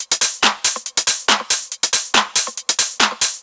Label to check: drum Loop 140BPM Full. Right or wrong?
Right